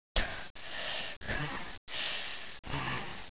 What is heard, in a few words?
Female breathe fast